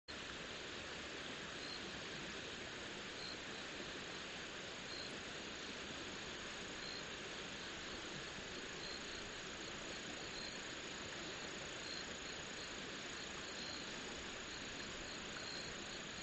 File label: riverside; Kyoto U; Ashiu